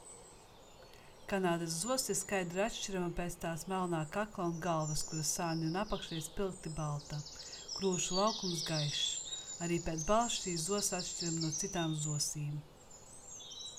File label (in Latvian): Kanādas zoss